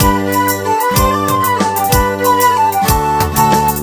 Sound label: Medieval jaunt; Samsung Galaxy S